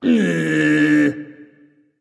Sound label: tounge; smite; voicepack; osiris